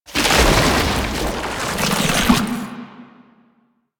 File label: Tank Infested Charge Rise; Enemies; Abilities; Warframe; Lasrian